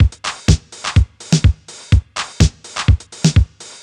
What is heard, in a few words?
drum room full 125, Classic Chicago House Drum Loops